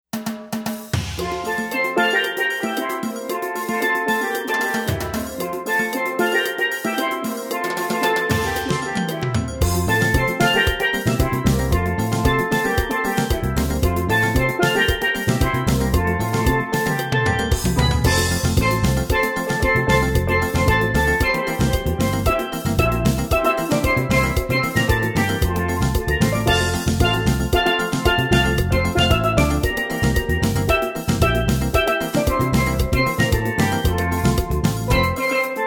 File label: Steel Drum Band